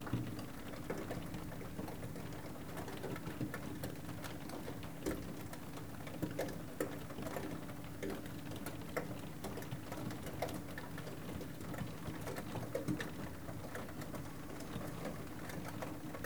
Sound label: snow wet glasssheet